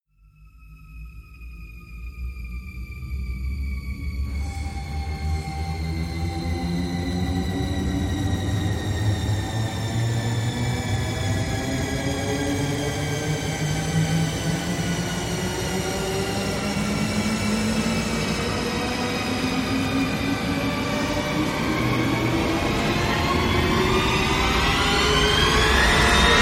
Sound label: rise long shriek